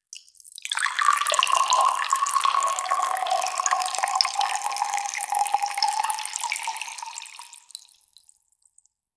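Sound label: shields draining until empty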